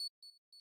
arp

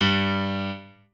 piano

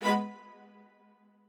strings

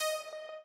arp

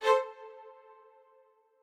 strings

